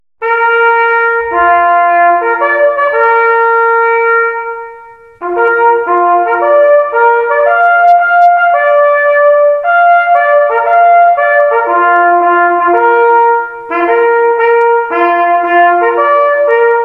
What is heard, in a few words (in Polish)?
sygnaly Bazant Na Rozkladzie